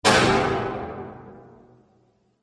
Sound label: Exploding Bang, bomb sound effect; Bomb Sound Effects; Explosion; Bombastic Booms And Battle Ambience